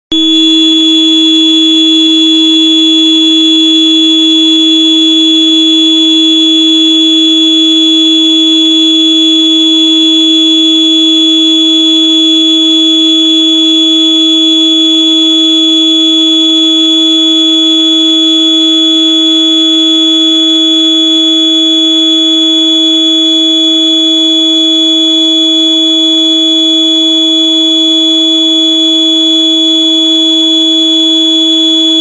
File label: Morning Noise Broadcast